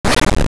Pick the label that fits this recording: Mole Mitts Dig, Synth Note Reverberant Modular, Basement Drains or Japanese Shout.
Mole Mitts Dig